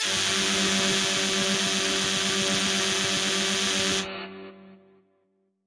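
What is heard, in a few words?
Modulation Proc, alarm siren samples, 120bpm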